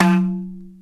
INDIAN, DrumBase; Crypton - DrumBase5000

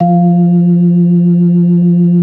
B3 F 4; Best Service; Advanced Media Trax Modern Composer; B3 LES 1

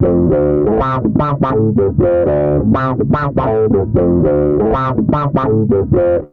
Bass Guitar Loops

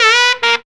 FUNKY SAX; Zero-G; Funky Elements

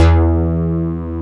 XFM BS, FM Syn Bass, Synth Bass

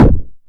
kick, Korg Electribe ER-1; Kit 21

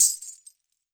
wrist, percussion; WORLD PERCUSSION; MIXED PERCUSSION; Best Service ProSamples vol.52; World Instruments 2

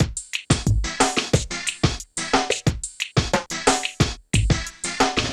PHATT BEA

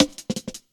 Skippy Cut, Drum Loops, Jungle; Zero-G Jungle Warfare 1, 2 & 3

